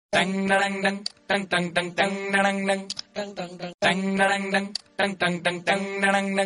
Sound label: Tang Tatang Tang